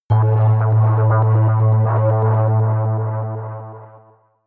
synth hit rhythmic scatter, hits impacts; whooshes rises

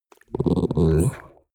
chihuahua growl muffled; natur-tiere; hunde

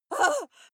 female lunatic scream fear short

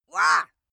female attack

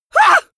male terrified scream short; comedy-cartoon; soundpool

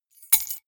key ring large drop stone; objekte; schluessel

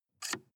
sports car int ventilation adjust soft; verkehr transport; autos

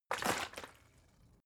bottle water big shake single; berufe

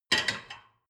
dishwasher plate grab

